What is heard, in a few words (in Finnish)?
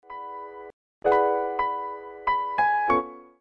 piano